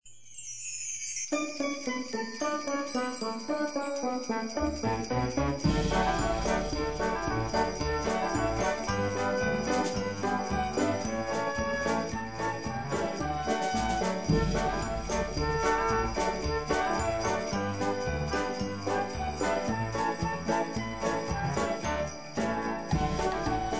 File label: jingle bells